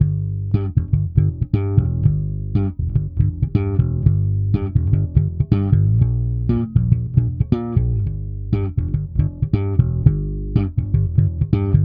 bass slap; crowley and tripp el diablo

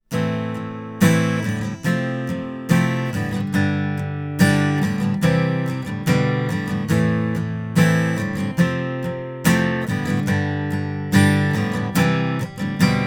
rythm; se electronics; m-audio

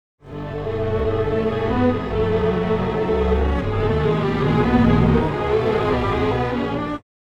strings, timestretch

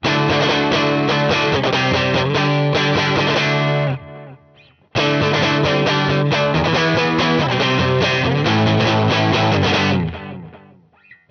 dynamic, delay; tc electronic nova

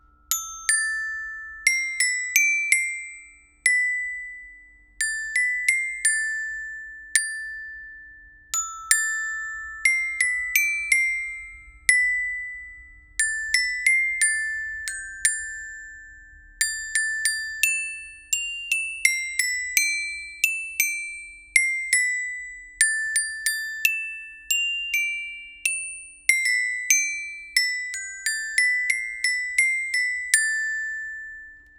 xilo comp; pendulum; quartet

